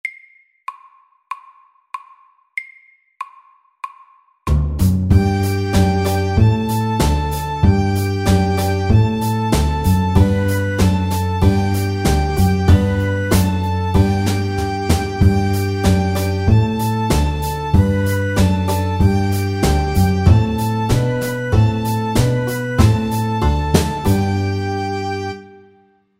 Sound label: Square dance SAKTE